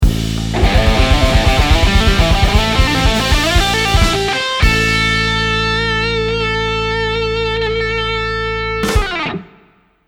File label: ending phrase, guitar